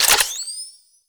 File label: Shotgun Quick Reload Layer; Pax Duviricus